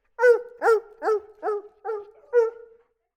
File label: Sad Dog Barking